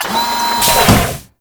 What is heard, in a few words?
Animal Foundry Working To Sleep, Machines; Liset Machines; Tenno